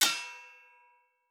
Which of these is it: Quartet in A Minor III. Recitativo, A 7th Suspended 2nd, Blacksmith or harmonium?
Blacksmith